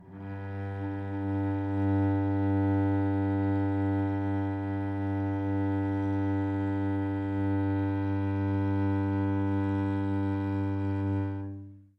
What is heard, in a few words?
Cello G2 Up Bow